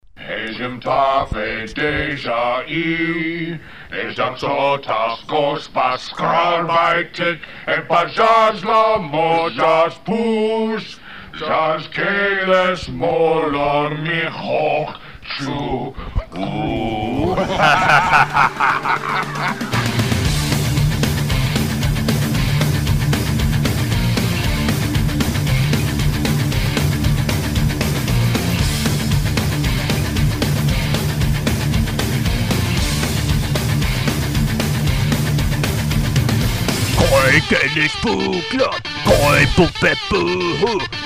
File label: anthem; klenginem